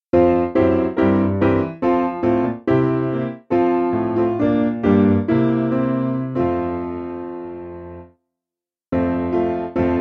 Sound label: Piano